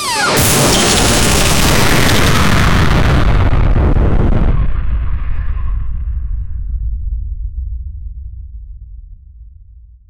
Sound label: create an anime skill whe, explosion